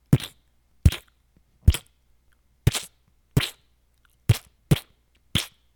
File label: Splats